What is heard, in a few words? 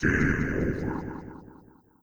Game Over, pinball; S3 Synthesis